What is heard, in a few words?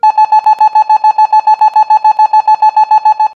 stutter; Tomar Electronics